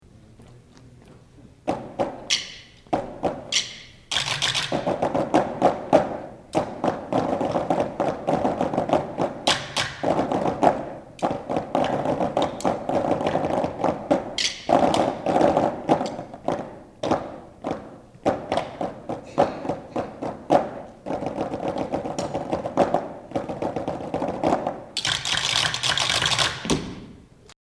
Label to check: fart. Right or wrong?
Wrong